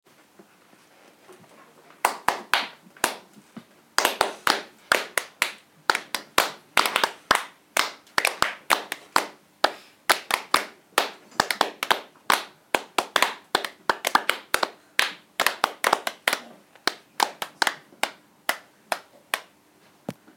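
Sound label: clapping piece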